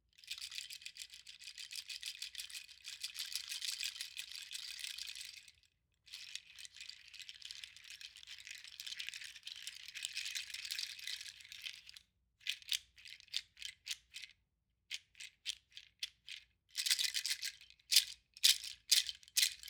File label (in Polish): Grzechotka z lupin orzechow Tobilera na trzonku; delikatny, kojacy, czarujacy dzwiek